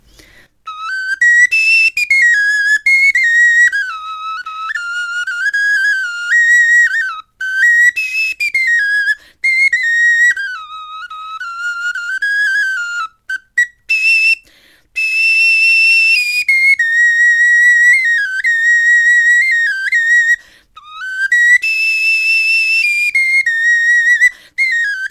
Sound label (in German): melodie